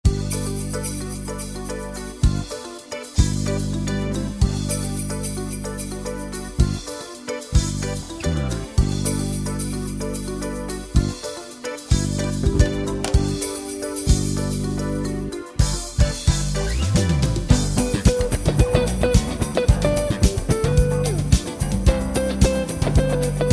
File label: Jingle Bells